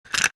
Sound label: Reloading Weapon